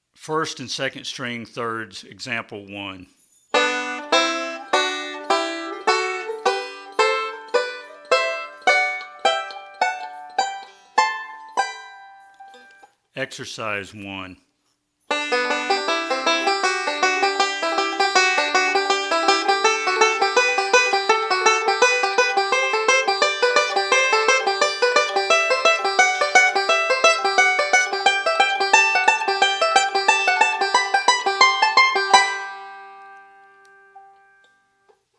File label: Thirds On The 1st And 2nd Strings